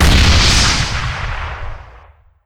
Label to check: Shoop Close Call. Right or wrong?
Wrong